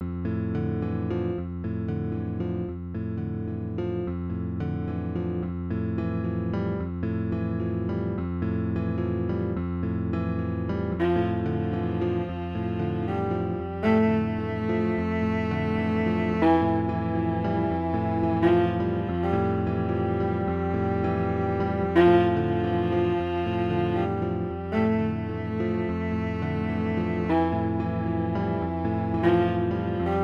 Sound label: instrumental